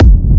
Techno Kick; 30 Techno Kicks 2025; Ghosthack

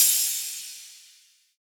Crash, Cymbals; Raptor; Cymatics; Dubstep Starter Pack; Drums - One Shots

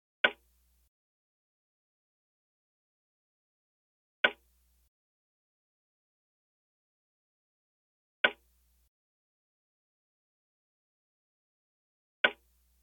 120 BPM G Min Percussive Hit; Cymatics; Blue Eyes

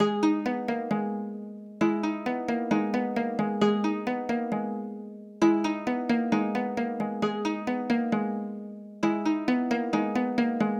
133 BPM G# Min Harp; World; Cymatics; Bazaar

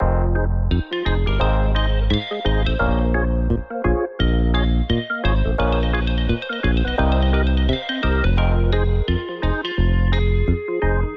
Classic Melody Loop - 86 BPM F Min; Cymatics; Oracle Sample Pack

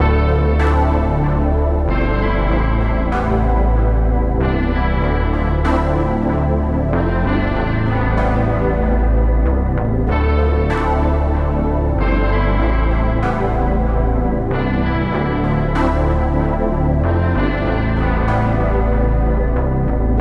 95 BPM A Min, Hip Hop Melodies, Analog; Dystopia; MTRON+OB6

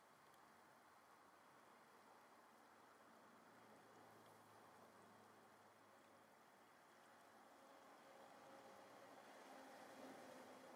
140 BPM E Min Texture; Loop Stems; Cymatics; Spitfire; 2022 Melody Collection; Drill

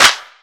Ice Clap, Dubstep; Ghosthack - AC2024 - Day 13 - Dubstep Sounds 2025

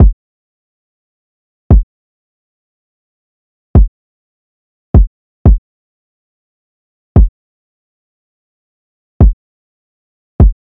Wood Block Drum Loop 176 BPM Kick; Cymatics; Mystery Pack Vol 4

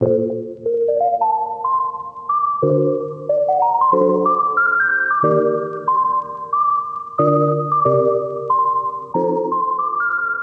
Lofi Melody Loop - 92 BPM A# Maj; Melodics; Cymatics - Eternity Sample Pack

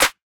Clap, Hip Hop Drums - One Shots; Cymatics - 9God Hip Hop Sample Pack

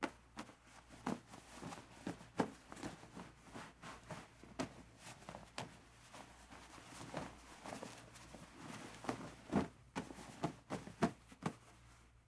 Putting On Flannel Shirt; Fairlight Sound Lib

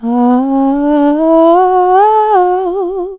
ahhh, Vocals, Chants

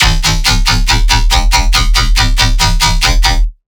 Riddim Bass Hit E; One-Shots; Bass Hits; Lorg; Ghosthack; Riddim Essentials Volume 2

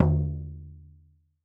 Frame Drum - D#; percussion; Cymatics - Infinity Beta Pack 2.0; Zeus Percussion Collection

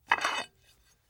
Ceramic Plate Scrape PU; Fairlight Sound Lib

